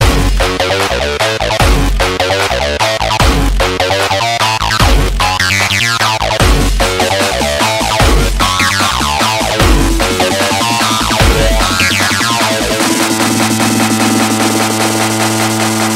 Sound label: Loop Dubstep